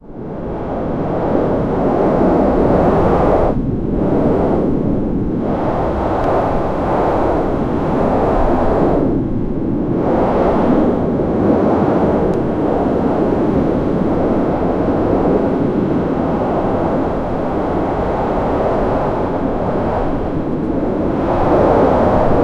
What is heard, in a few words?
sounds of strong wind lou